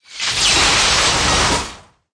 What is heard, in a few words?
Attraction; kid; battle